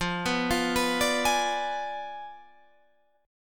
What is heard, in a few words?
F Diminished 7th F down Guitar Open G